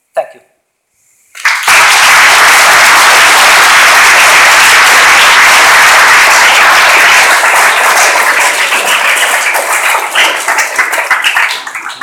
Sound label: applause; pixel9